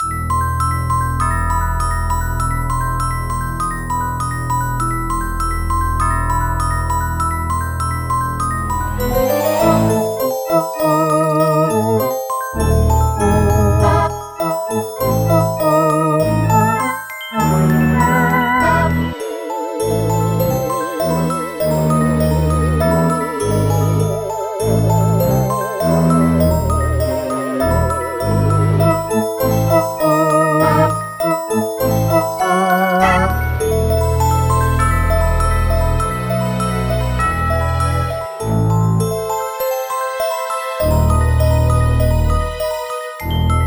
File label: Haunted House; New Super Mario Bros. 2; Gold Ring